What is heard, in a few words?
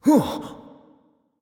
nv breath; smite; xbalanque